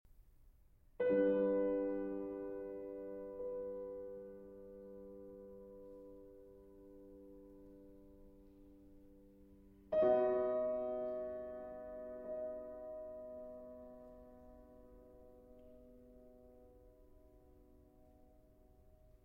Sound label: Piano Solo; Silvestrov